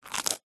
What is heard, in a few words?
catoon bite munch single